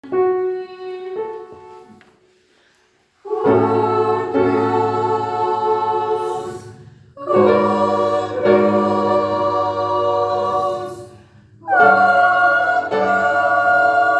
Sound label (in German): Oberchor